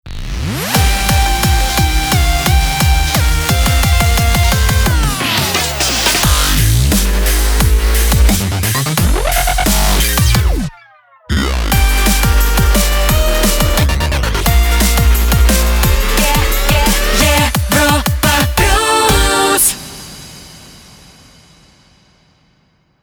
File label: Jingle B Ramp; Europa Plus